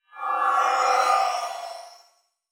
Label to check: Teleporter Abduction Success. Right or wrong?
Right